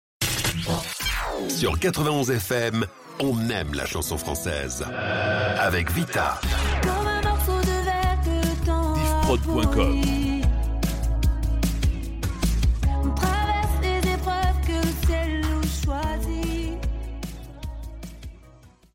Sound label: Power intro; 91 FM